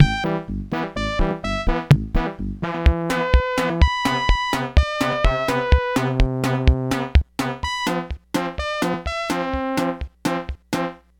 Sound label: pss-140 polka nite